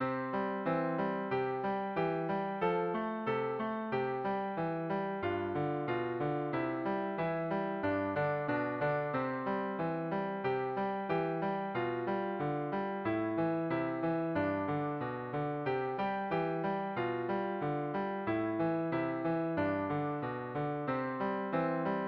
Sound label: The ABC Alphabet Song (Variations) for Beginner's Piano; Traditional